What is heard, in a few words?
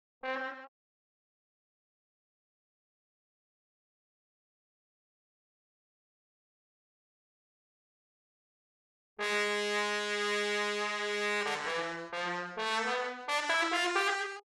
Trombone; Holst Jupiter